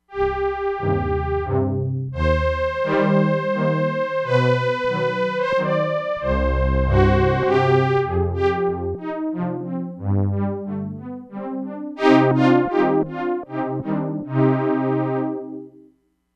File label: VELOCITY CONTROLLED SOFT STRINGS